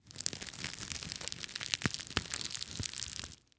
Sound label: Industry Tool Rope Twist And Scrunch